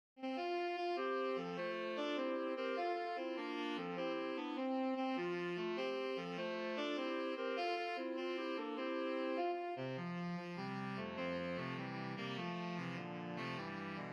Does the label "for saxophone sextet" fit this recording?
Yes